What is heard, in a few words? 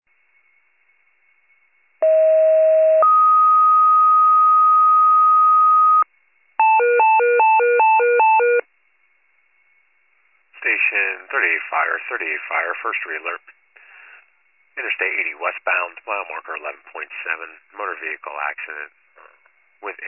FIRE pager alert